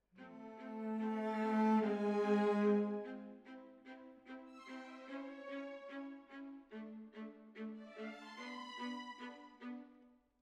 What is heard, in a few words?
Cellos